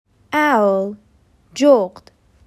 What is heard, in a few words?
Owl